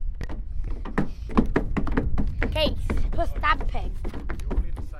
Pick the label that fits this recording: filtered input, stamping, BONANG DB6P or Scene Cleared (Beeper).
stamping